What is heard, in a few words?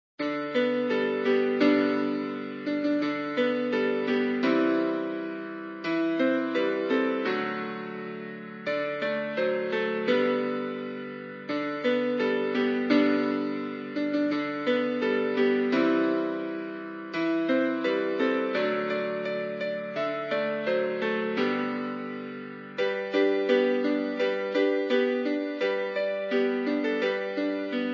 Jingle Bells; Musescore